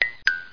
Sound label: blink; Pyre